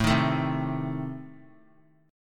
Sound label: A Diminished, down Guitar; strummed